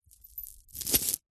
household jewelry necklaces set down carpet or soft surface